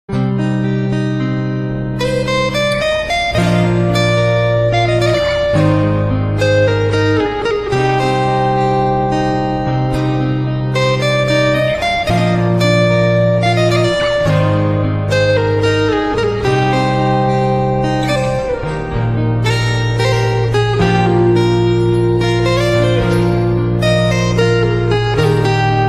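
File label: new instrumental ringtone; sangemarmar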